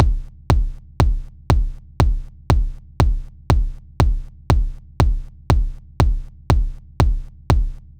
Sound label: Kick